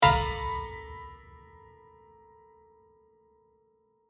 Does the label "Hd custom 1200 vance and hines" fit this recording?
No